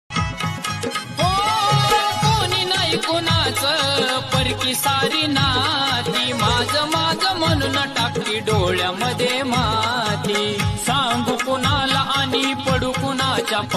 ringtone marathi

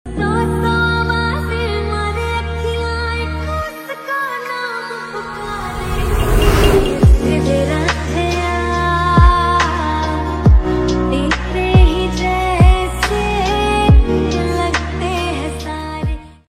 lofi remix songs

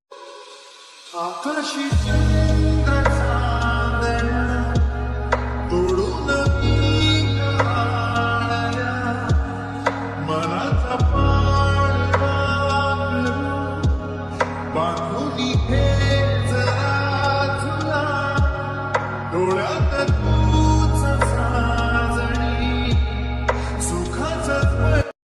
marathi ringtone marathi song ringtone

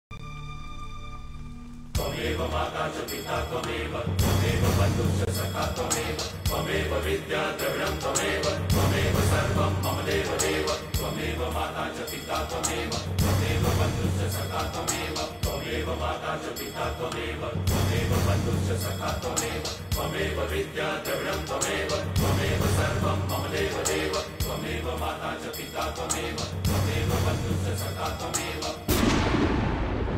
bgm; KKKG

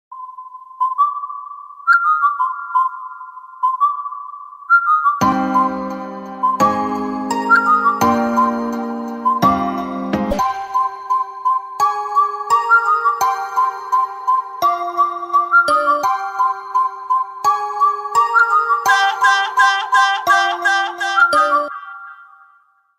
horn blow bgm panjabi song bass boosted ringtone

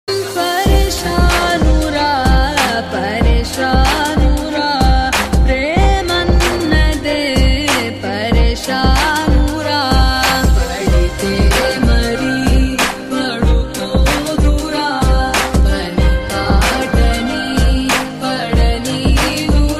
telugu love ringtone romantic love song ringtone

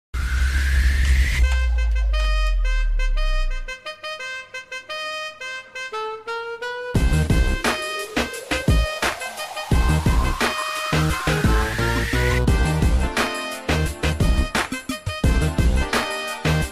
tamil mass ringtone, bgm; soodhu kavvum

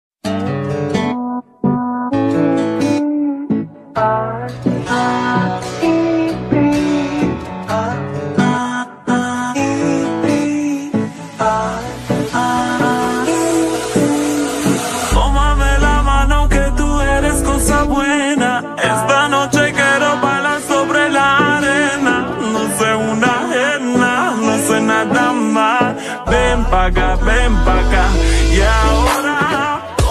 slowed reverb ringtone beats

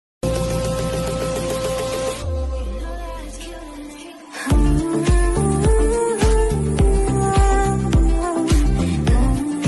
best ringtone tamil ringtones song short

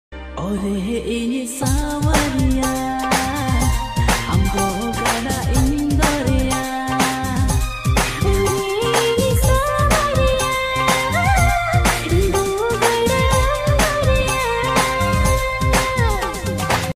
santali ringtone

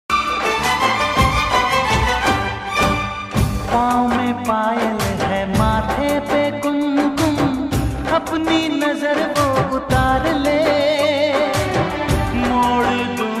bollywood music song; garba; navratri

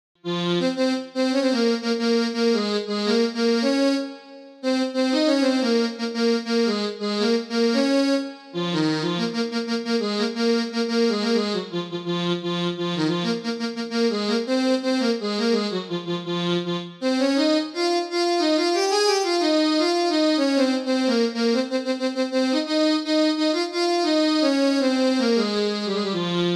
perfect piano

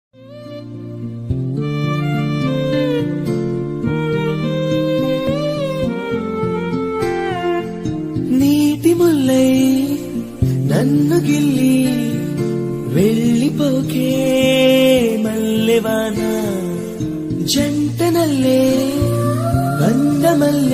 love bgm ringtone, telugu; varsham

